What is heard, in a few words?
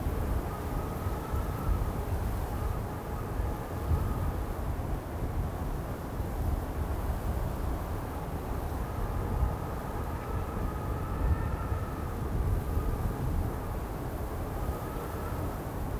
sandstorm ambient; CrossCode; desert